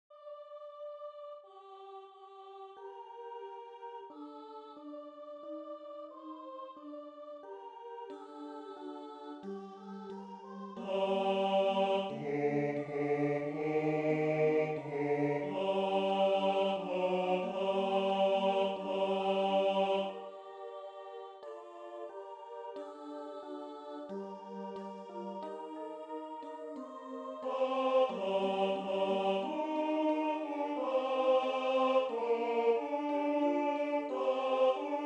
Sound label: Bass; Pater Peccavi